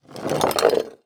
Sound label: Foley Movement Glass Slide On Wood Short